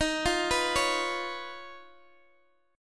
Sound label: Eb 7th Suspended 2nd Sharp 5th, Guitar Open G; x,x,13,10,12,11; down